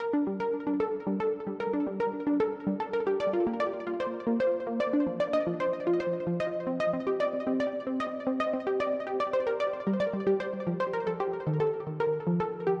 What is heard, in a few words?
Pluck, 150BPM